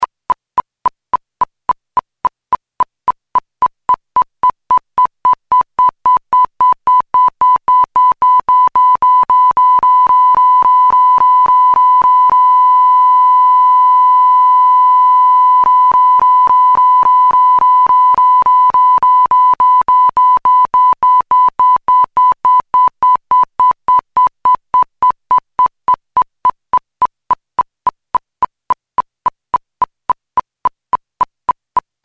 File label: Varying Pulse Width Faster